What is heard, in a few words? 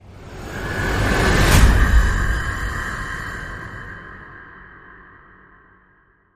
Dragon Fire Whoosh Hit